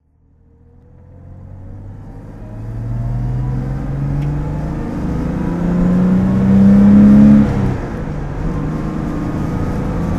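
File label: jaguar etype fhc s1 driving, engine sound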